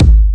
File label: Moombah Kick; Hip Hop; Moombah Kit; style kits